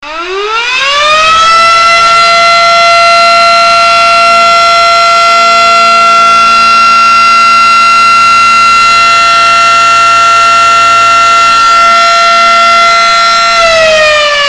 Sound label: Comm Warn